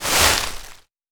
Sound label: Leaves